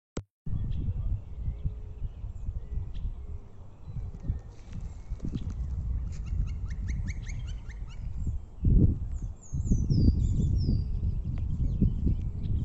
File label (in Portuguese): Canto do pica pau de peito pontilhado